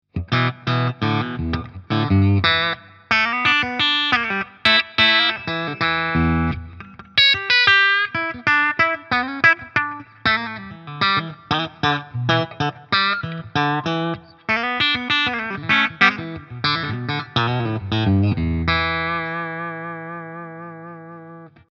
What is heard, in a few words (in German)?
Asat Deluxe Steg Singlecoil clean; GL Tribute